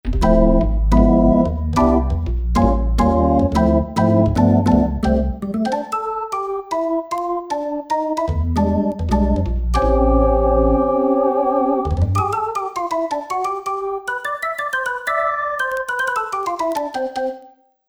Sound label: Roomy Organ